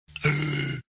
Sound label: aaaahn